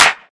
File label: clap c sharp key; single hits one shots